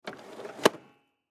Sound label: Snow Blower Chute, Snow Blower Plastic Chute Turn Right; Snowblowers; Household; Blastwave